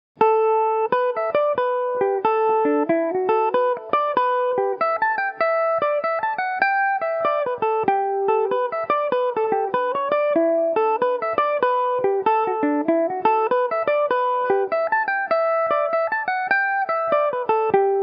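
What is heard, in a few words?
E dorian, irish mandolin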